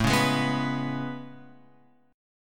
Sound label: A Major 9th A, chord; AM9 Chord {5 4 6 4 X 7} strummed